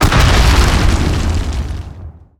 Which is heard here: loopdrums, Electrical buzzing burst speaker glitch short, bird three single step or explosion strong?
explosion strong